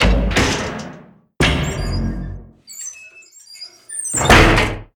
Hatch Open From Bottom

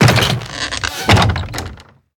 Stuck To Raised; moul; tldn

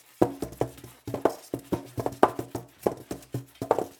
Styrofoam Roll Lp

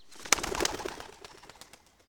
Bird Flaps

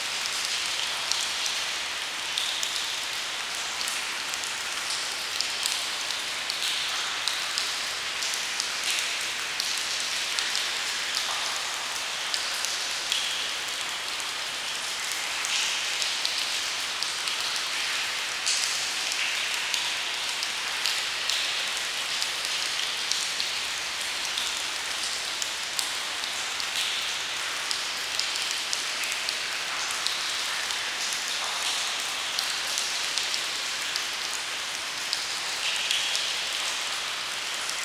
Cave Water Trickle Loop

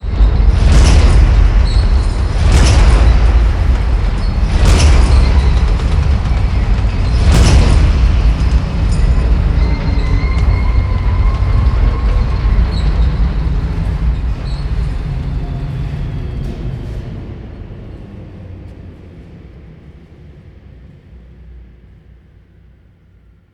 Solution Ladder Up

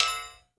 Marble Stn Hit; moul

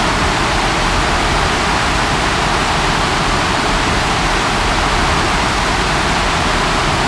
Waterfall; Kalamee

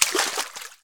Water Run

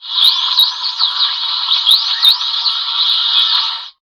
Underwater Creature; moul

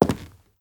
Soft Wood Walk; moul-assets

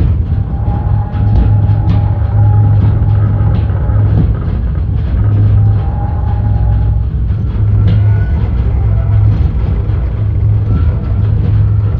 Wind Mill Loop

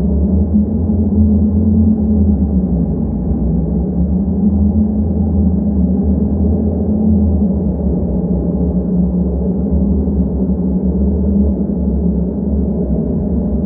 Chasm Tunnel Wind Loop

